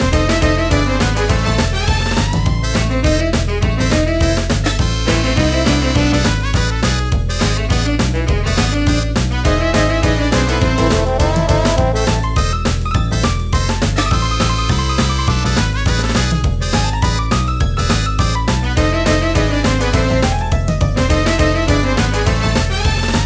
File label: Token Tango; donkey kong country 2 fan remastered